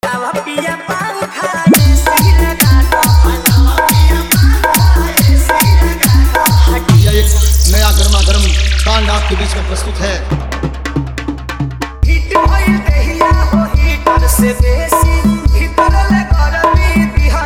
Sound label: Hard Bass Mixx